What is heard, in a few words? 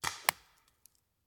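Paintball Gun Impact; Sound Ideas; Guns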